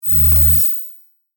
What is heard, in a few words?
Electric Discharge, Magnetic, Science Fiction